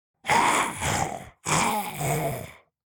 Demon beast imp monster breathing

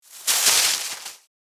Grocery plastic packet rustling

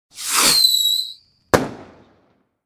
Fireworks two stage launch whistle and explosion

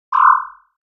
Digital futuristic alert

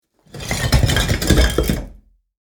Flipping various block pieces on a pile of rock

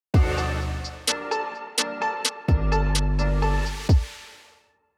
Urban beat logo intro

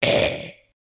Male zombie attack

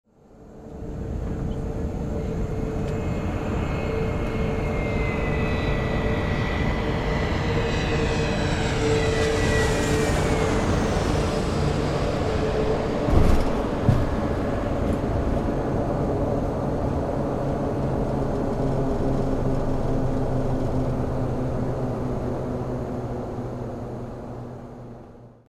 Airplane landing medium distant perspective

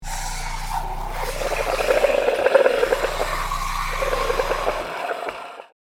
Milk frother milk bubbling; appliances

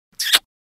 Wet lipped smooching kiss

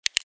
Tactical light button click

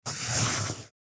Pencil drawing smooth medium stroke

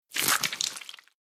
Knife or dagger flesh moves inside

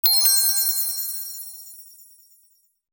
Fairy dust magic sparkles

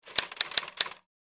Royal Classic vintage typewriter typing

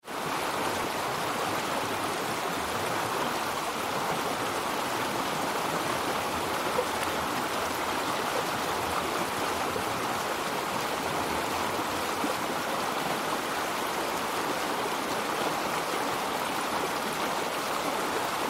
Colorado stony river with strong flowing water

